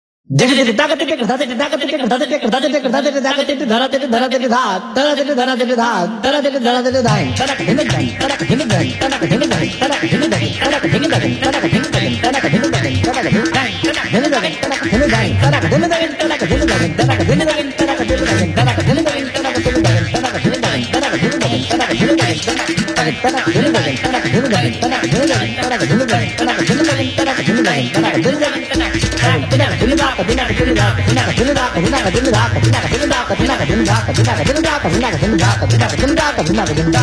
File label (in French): Percussions indiennes